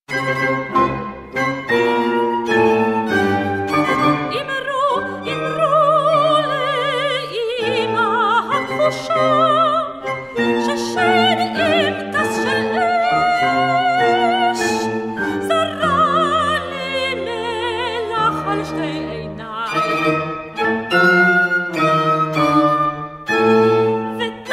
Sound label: soprano and chamber ensemble, sung in Hebrew